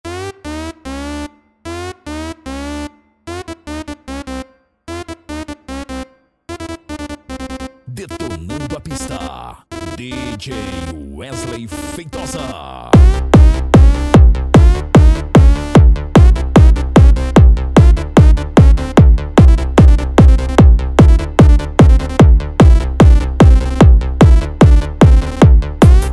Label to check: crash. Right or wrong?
Wrong